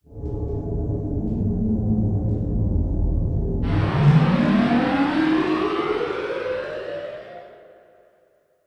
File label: Title Transition Evolver Transformation; Production Element